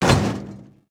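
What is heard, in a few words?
impact metal hollow; Cars; XBOX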